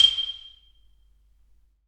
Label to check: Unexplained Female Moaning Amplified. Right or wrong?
Wrong